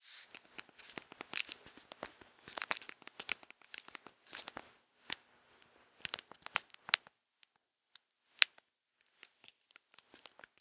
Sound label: Texting